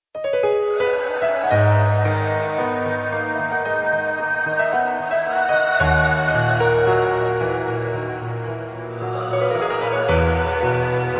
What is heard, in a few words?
Piano